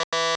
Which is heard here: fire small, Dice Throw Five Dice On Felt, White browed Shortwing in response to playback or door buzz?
door buzz